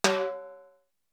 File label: R8, PERC; Greytsounds VOL-1